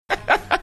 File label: chuckling